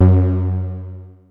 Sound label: OB F#3, Rare Synthesizer; Hollywood Edge - Giorgio Moroder Rare Synthesizer Collection; OB 4 VOX